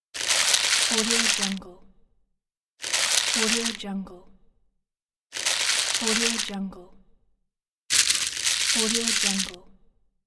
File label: wax paper crumpling; moonaudioproduction